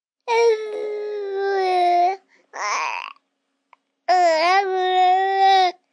Baby Fuss Babble, Baby fussing, 1 yr., long, babbling, human voice, kid, child, infant; Talking Sound Effects